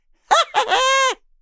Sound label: laugh; Racetrack Riches; megaboard